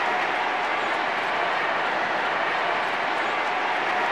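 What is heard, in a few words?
Football Crowd Ambience Loop; Crowds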